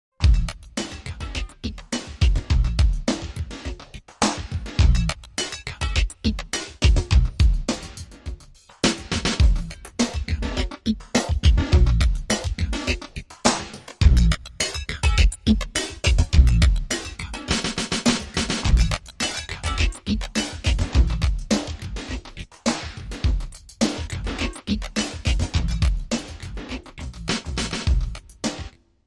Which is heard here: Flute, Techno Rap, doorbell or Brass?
Techno Rap